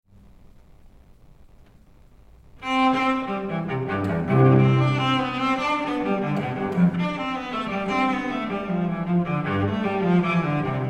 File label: Cello; JS BACH Suite for Solo Cello No3 in C Major III Courante LIVE; Mimi Furuya Cello